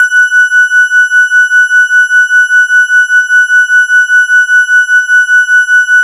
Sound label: E-HARP, DX HARP; Wizoo - Powered DX